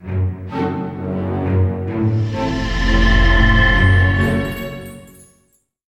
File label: Closing (Stinger); medievil game rip